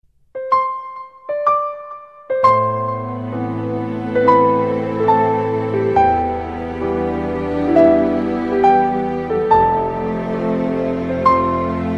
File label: Melody